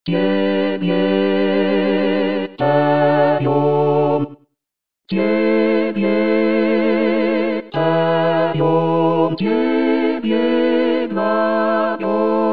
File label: Tutti; Tchaikovsky; tebe poem